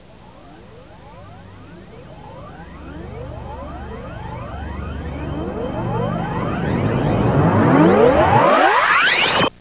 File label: space